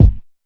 classic hip hop kick; Classic Hip Hop Mega Kit

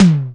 linn TOM; DRUM MACHINES

